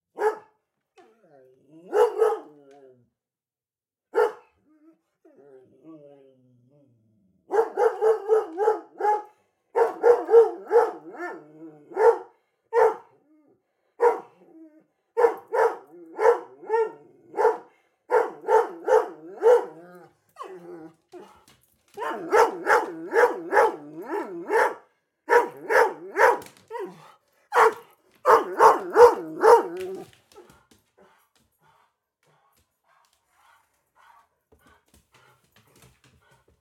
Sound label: Dog German Short-Haired Pointer Bark and Whimper; Soundopolis; Dogs